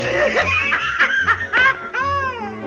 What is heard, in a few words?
laugh